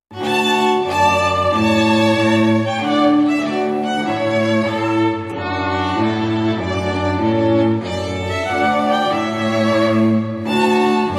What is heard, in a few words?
Trumpet Tune